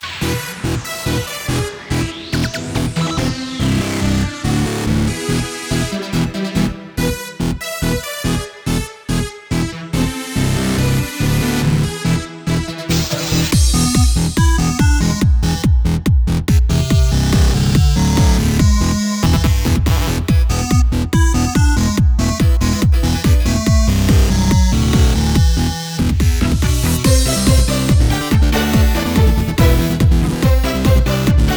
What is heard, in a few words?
Main Menu Loop; Move or Die; ps4